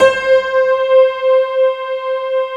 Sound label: piano pad C5